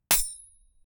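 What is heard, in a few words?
knife throwing whoosh metal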